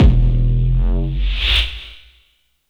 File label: DRM BD Disto; Polyend; Plughugger